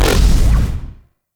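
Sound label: Pod Shotgun Projectile Fire; Warframe; Weapons; Tenno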